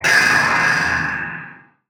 attack; Barotrauma; Legacyfractalguardian2

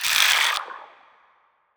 damage; Barotrauma; Spineling

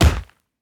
Limb Blunt; Damage

